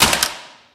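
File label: physics clipboard impact large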